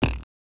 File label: Percussive Elements; 99Sounds; The Weird Side Samples